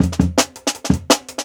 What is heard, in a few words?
Jungle Breaks